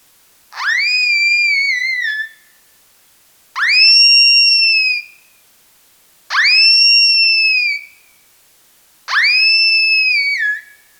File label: BPA Audible Call Lowered By 10